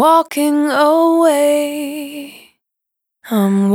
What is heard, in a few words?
Dry, Single Voice Melodic Loops, Vocal Samples; Sony Creative Software; Pocket Diva World Class Vocal Samples